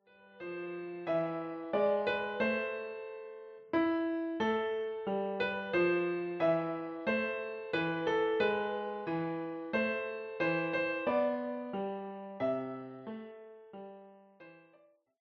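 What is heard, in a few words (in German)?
Maria durch ein Dornwald ging, Klavier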